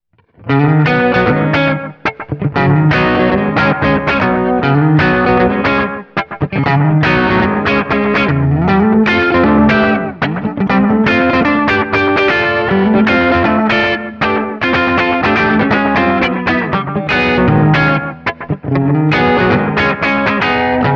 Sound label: slap